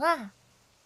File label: soft Wah, vocal